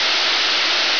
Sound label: steam loop; traps; quakemapping